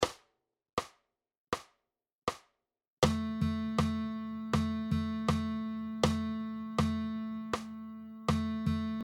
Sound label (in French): Dictées de rythme, guitare